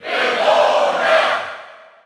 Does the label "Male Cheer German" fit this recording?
Yes